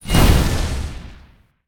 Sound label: Mech Tyranno explo; monster